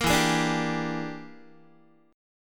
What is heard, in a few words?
E 7th Sharp 9th Flat 5th, Guitar; strummed; chord; JGuitar